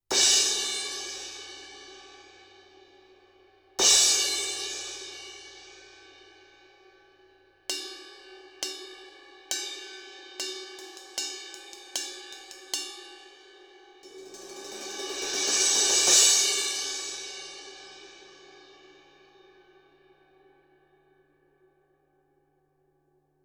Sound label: Cymb Crash Far Edge